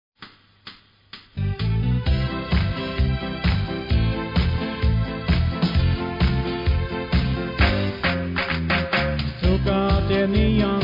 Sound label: Polka